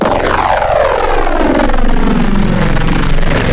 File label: Wavetable Airplane; Synthetic's Serum Collection; Audeka Wavetables